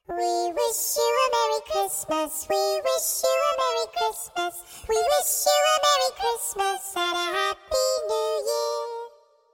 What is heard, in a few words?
happy new year christmas ringtones